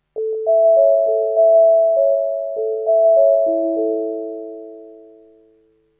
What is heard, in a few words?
NHK chime